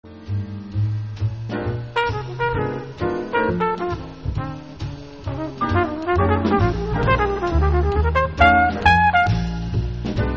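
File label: trp solo, trumpet solo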